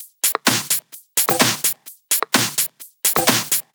Loop, 128 BPM

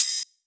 Ride, Cymbals; Mutekki Media; Vengeance Electroshock Vol.2

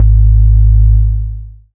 Bass Shot G#; Mutekki Media; Vengeance Electroshock Vol.2; Bass Shots

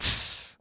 Human Beatbox, Beatbox Hits; Loopmasters; Vocal Hits And Kits; Human Beatbox 2

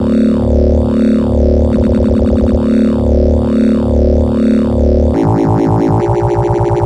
Bpm140 Wonky Bass Loop Dm, Dubstep; Prime Loops; Dubstep Illusions

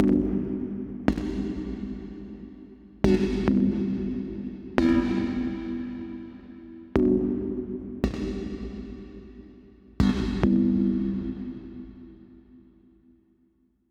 Bpm138 Chord Loop GmajCmaj, Dubstep; Airy; Ocean; Prime Loops; Dubstep Illusions

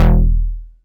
Bass Shot E

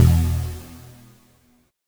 NOISY B1, dubstep; Jack Sparrow; Deep Dubstep Progressions

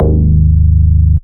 Bass Shot D#

